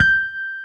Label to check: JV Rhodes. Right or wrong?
Right